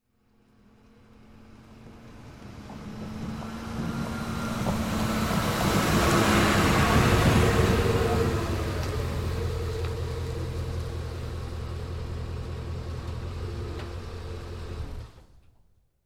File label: Ford F150, Truck, Pull, Up, Shut, Off; Transportation, Automobile; Truck Sound Effects